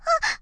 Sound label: combobreak; BlackCatSkin; BlackCatSiRi